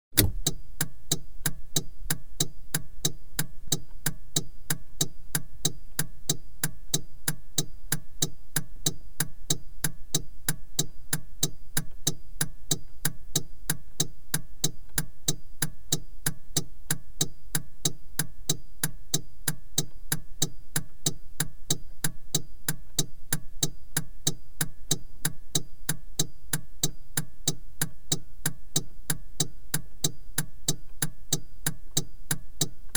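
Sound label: interior Indicators operating, Cars; BBC Sound Effects Library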